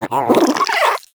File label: Vocal Idle, Hunting Critters; Nexifera